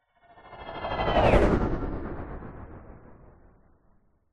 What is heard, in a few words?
science fiction hovering vehicle pass by fast